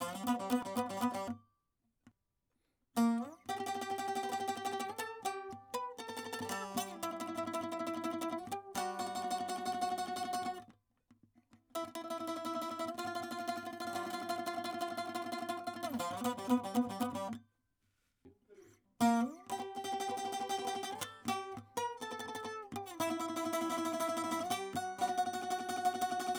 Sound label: saz